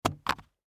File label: Tv Remote Drop, Tv Remote Drop Carpet; Foley; Drops; Objects; Blastwave